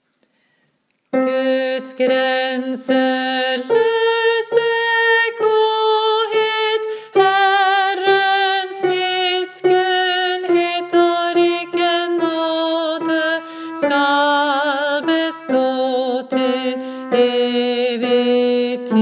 bass; salme-23 guds-grenselose; åfjord

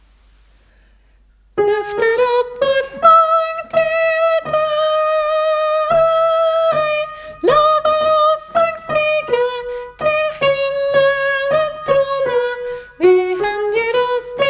la var lovsang stige refr tenor; åfjord

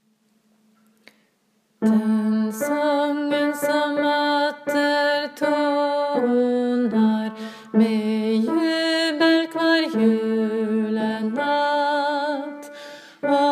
bass; det-lyser; åfjord

